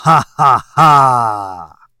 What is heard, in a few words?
sarcastic haha